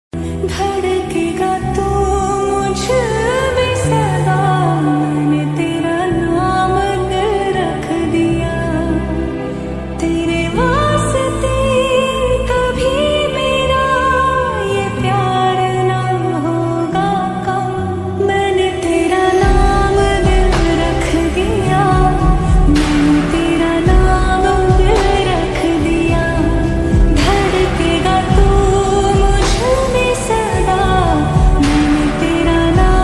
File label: lofi